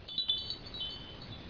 small chime; baseq2

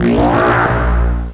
tech; openffa; ctf

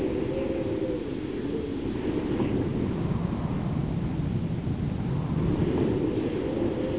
windy; spawngirlsounds

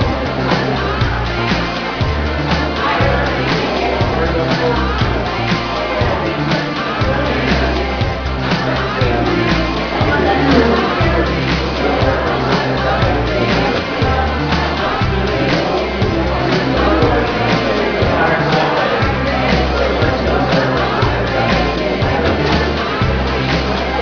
people

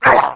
gladiator pain

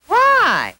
gurp; Wilma

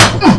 grapple hit; lmctf

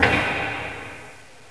metal

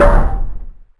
pain; devastator

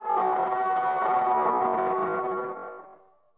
screech

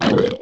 gurp; openffa

